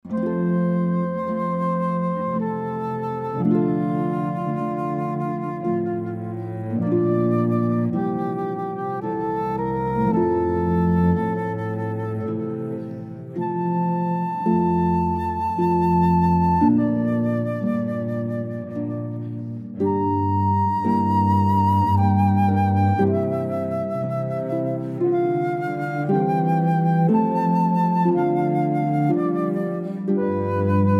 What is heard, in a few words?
Air, Handel, harp flute cello